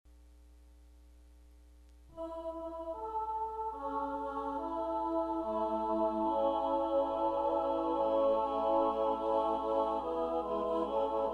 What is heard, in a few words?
SATB, choir; Old King Cole